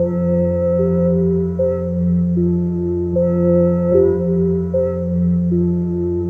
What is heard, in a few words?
Drone Bass; Sonic Foundry (Sony Creative Software); Loop Noir; Session 08 - Psychic Investigator